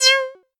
cat like

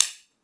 shogi

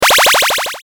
powerup

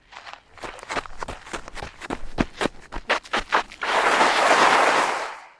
soccer sliding; sports

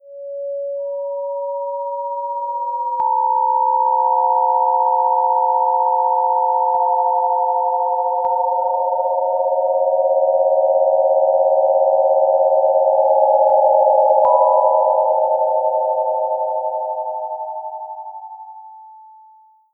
death sound, horror; 誘い４/試聴注意

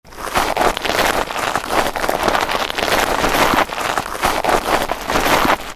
stamping on the ground; horror

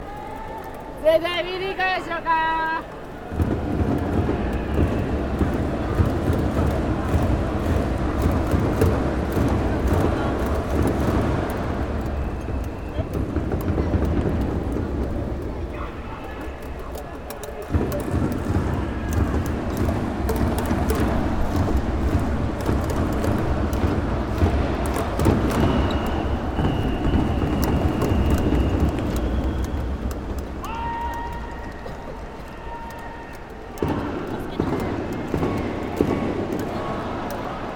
baseball stadium

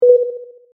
button

